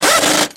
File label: impact wrench